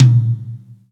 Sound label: tom; fofix